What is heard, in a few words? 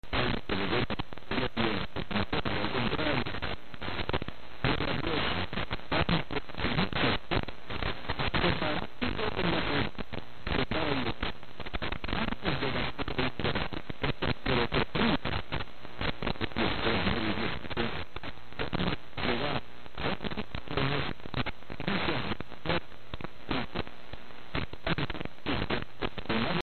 Noise